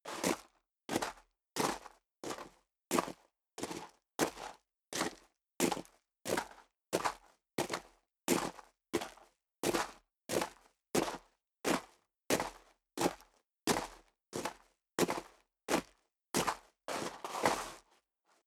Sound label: step high heels gravel medium walk sequence; schritte; Footsteps; menschen